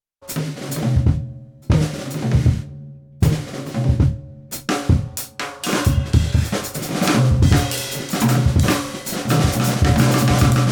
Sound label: Drum Solo Attack 15 Release 50 15 1 AutoFast WarmWarm Off